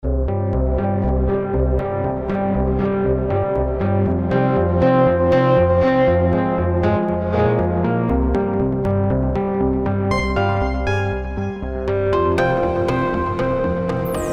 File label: Gentle; Fusor Preset KB